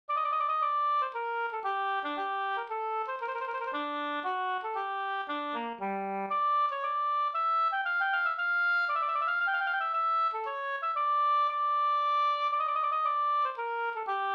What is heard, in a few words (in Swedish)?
polska Gammal Alftapolska